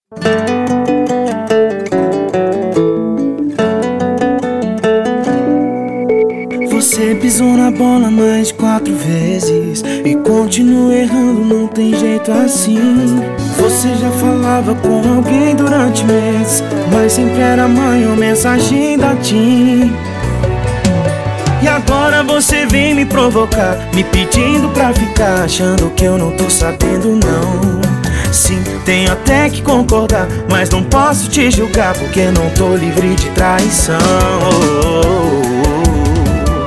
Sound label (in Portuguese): pagode